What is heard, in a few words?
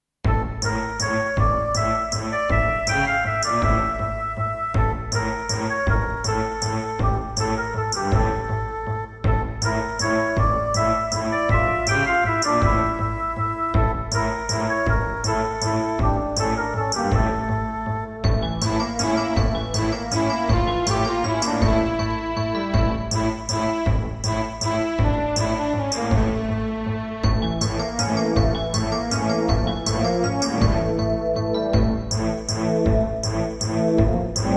Creative Sound Blaster AWE32